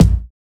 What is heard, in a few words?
Tom A; samples